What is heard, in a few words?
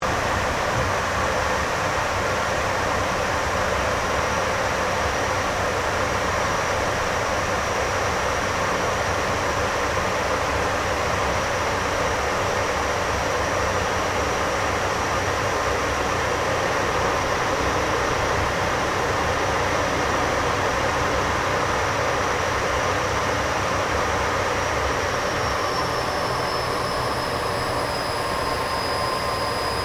AIRCRAFT CA-27 SABRE FLYING INTERIOR; CA-27/F-86 Sabre; Airplanes; Vehicles